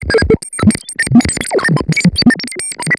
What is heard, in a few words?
robot chatter; Earth and Beyond; EA Games